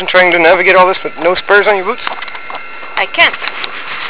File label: spurs on your boots; Korea; Kwanak mountain